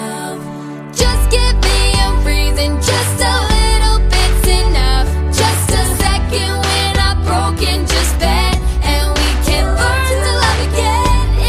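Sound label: Is Calling R&B, ringtones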